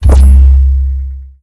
Object Creation

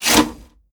Elev Locks Foot Pedal; moul-assets

